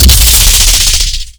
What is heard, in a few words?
lgun melee, weapons